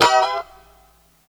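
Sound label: F#4 A mono slide L, guitars; Spectrasonics; Hans Zimmer Guitars VOL-1; 90's Sample CDs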